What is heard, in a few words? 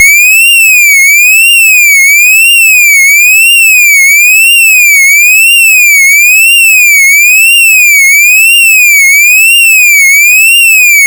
Sweeping, combined signal; pfannenberg; signaling-technology; combined-signaling